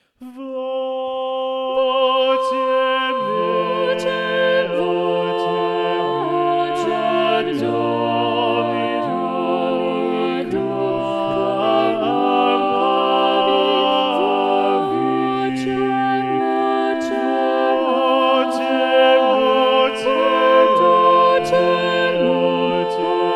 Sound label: SATB Balanced Voices; Voce mea; Giovanni Croce